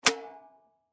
Drum Snare Popcorn, Snare Drum Popcorn Throw Off Turn Off; Drum Kits; Blastwave